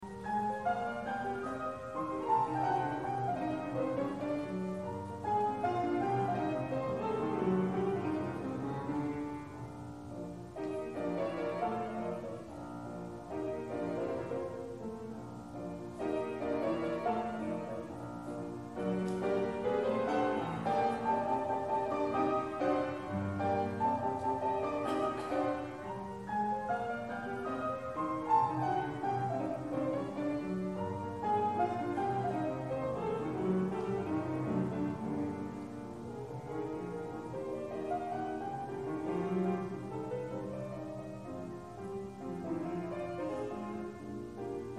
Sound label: piano soloist playing Sonata in G Minor; Robert Schumann